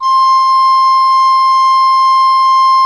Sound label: Cathedral Organ, HOLZGEDACKT, C5; Propeller Island